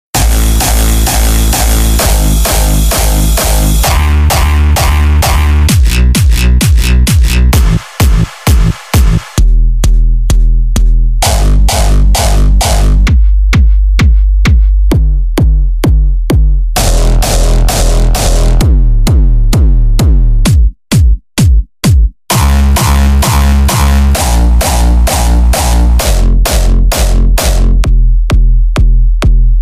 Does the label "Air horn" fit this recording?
No